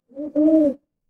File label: animal owl